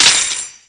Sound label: cuffs; lance